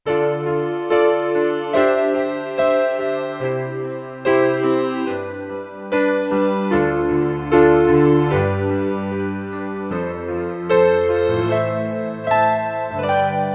Roland D-70 MIDI Piano; Factory Performances

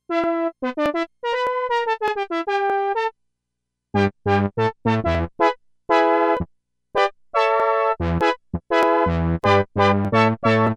Tropical Horns

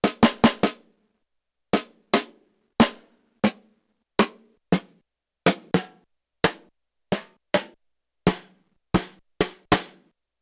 snares, RX7 toms, Snare Drum Collection; Yamaha TX16W

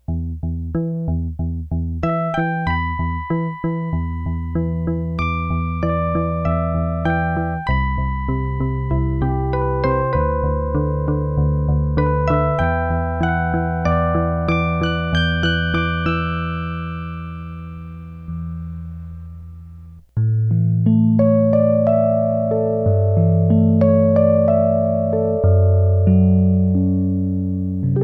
synth sounds

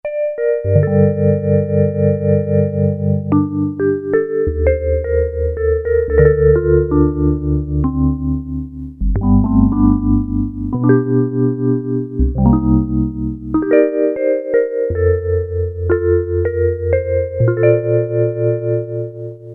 Vibes, Korg PolySix; Factory Presets Bank A

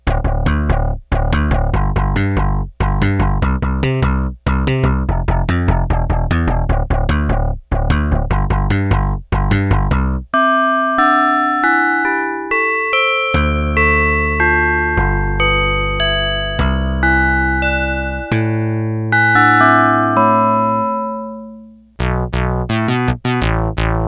SYNTH SOUNDS

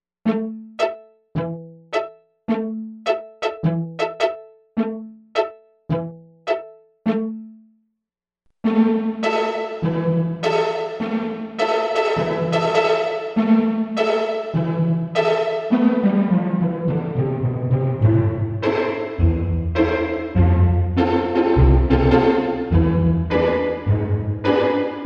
LARGE ROOM II

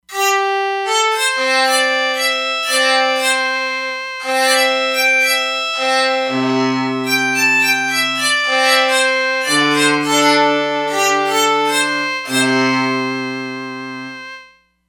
Er-Hu, E-mu Proteus-3; Factory patches

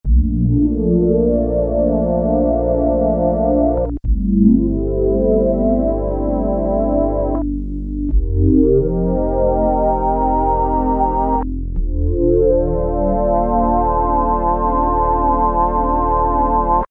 Resonant Rise, Roland Juno-106; Factory Preset

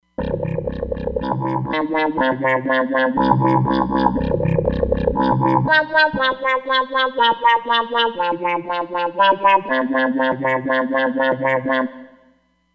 Wah-Wah-Wah, Roland Fantom XR

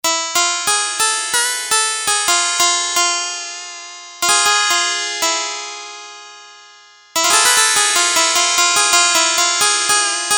Synth Sitar

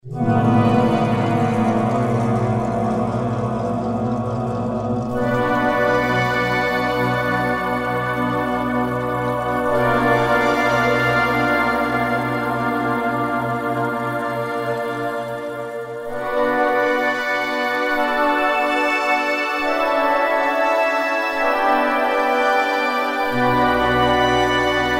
Galaxia, Yamaha TG77; Factory Presets

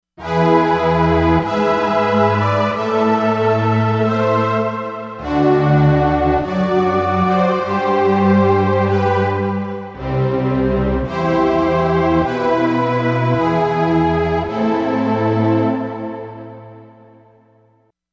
Pad Voices, Korg MSC-07S Synth; Korg MSC-07S Synth 2

